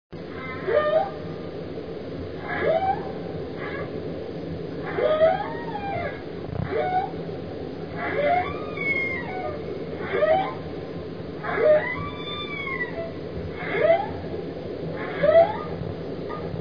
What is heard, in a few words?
Humpback; Hydro; Volo